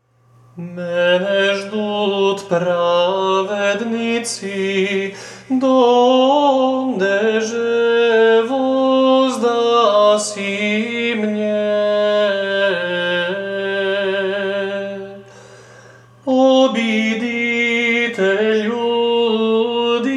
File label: Stihir prvi glas, Serbian chant